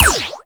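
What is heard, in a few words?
Assault Rifle 2D Shot; Warframe; Weapons; Tenno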